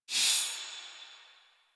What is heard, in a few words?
Crafting UI Close; Warframe; Cetus